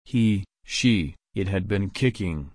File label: kick